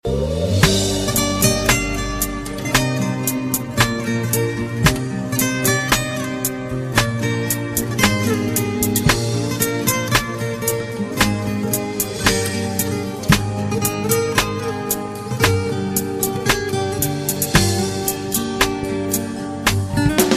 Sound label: Ringtone